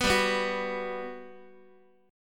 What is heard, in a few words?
G Major 7th, G, down, Guitar; Listen to GM7 strummed; GM7 Chord